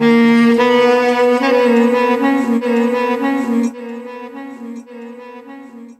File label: Sax Delay